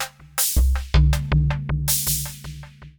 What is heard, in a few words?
PERCUSSN AMBNT 160, Ambient, Drum